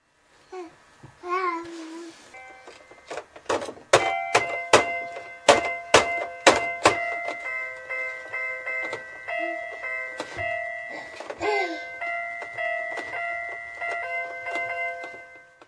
plays keyboard, Ainsley has rhythm as she dances to her music